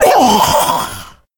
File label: death